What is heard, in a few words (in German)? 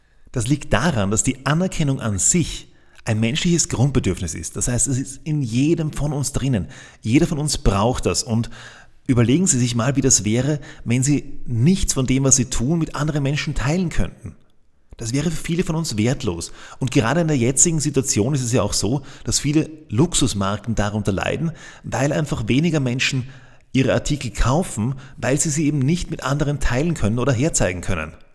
applaus